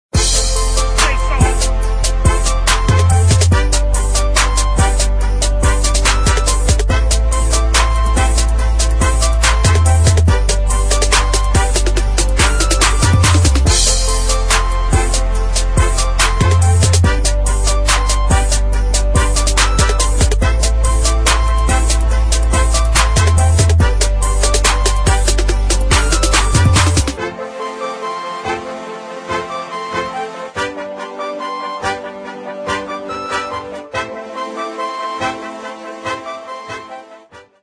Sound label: instrumental